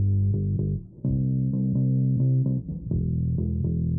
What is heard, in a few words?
bass; musdb18